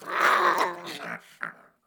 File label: rat hurt